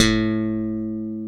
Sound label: A 1 SLAP, SLAP BASS; AKAI S-Series CD-ROM Sound Library VOL-7